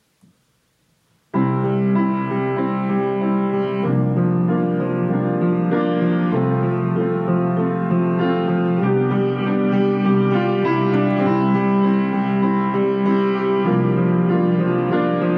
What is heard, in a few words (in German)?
klavieremotionen